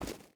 footstep rubber boots gravel run; Rust

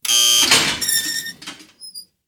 transaction; Rust

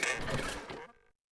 Cannon Heal; Civ4 warlords

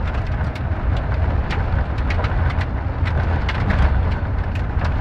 vibration on landing camera

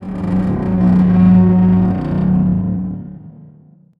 Amb Constructions; Red Orchestra Ostfront 41-45

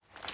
reload lift, foley; m4; ModernWarfare

